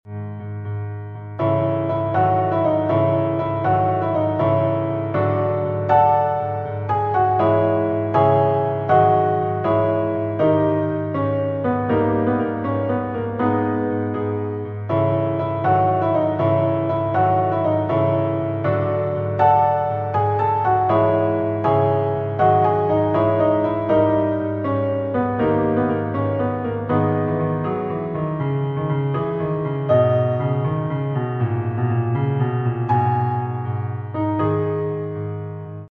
piano edirol orchestral

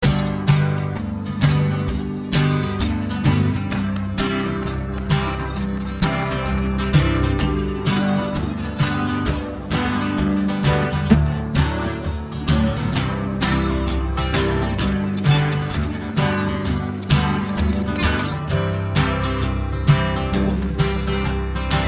GUITAR

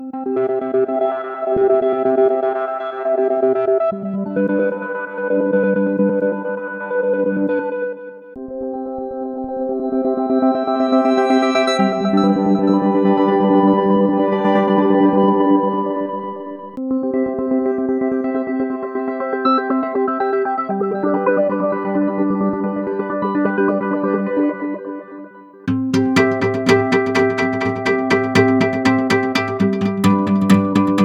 Rhythmic Pulse

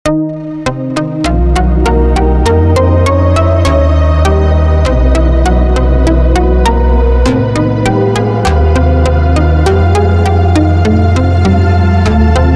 Ambient BP

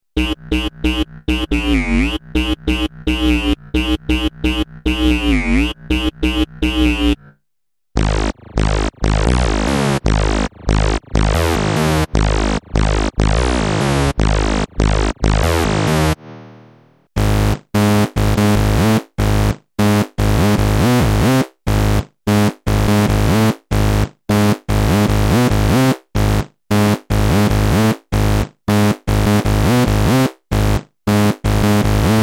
Lazerbass

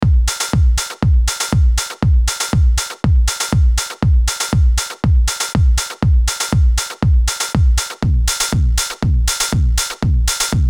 Dist Tube Culture Drumloop; ARTURIA

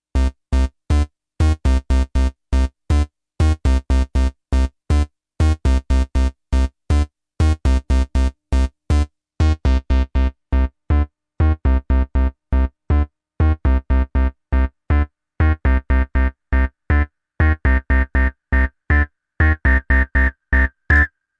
Acidbox II Synthloop; Erica Synths

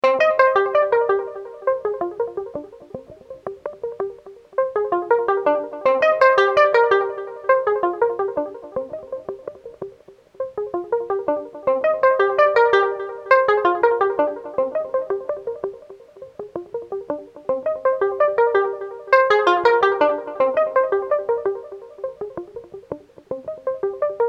plucksound

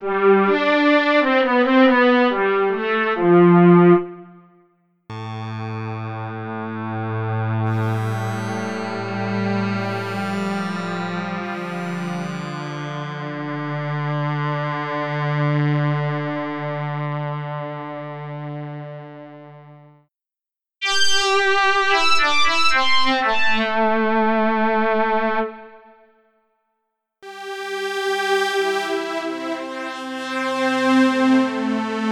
Synclavier Strings

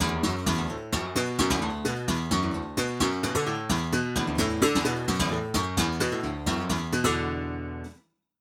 Strums

